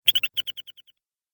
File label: Arcade Game Chirpy Beeps; Video Game Sound Effects; Retro Inspired Sound Effects - Think Nintendo, Atari, C64, Etc.; Andrew Fleming